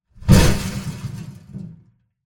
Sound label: Big Grenade Explosion